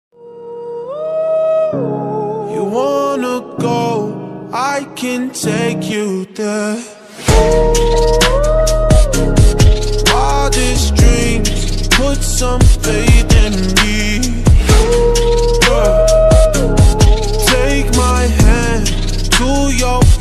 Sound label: Fantasy Ringtone